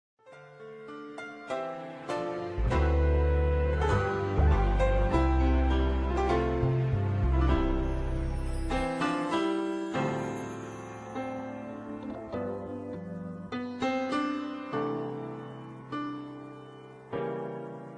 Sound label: instrumental